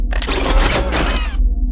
arm rotate

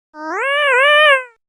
cat effect; yume nikki

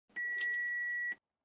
engine start car; roblox

